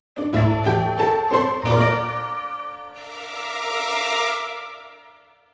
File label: Jingle Halloween; Brix